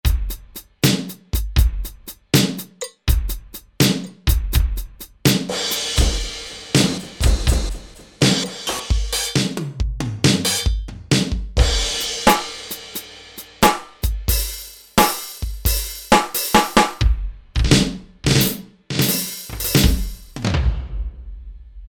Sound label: Sluggo Drums, E-mu Pro-Cussion; Factory ROM kits